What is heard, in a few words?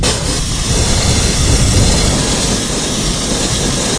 fire, extinguisher; hl2